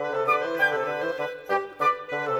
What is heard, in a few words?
Winds, Orchestral